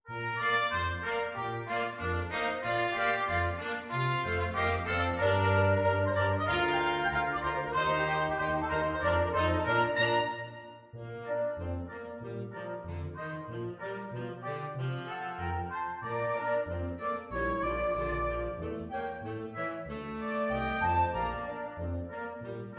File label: flexible mixed ensemble 5 players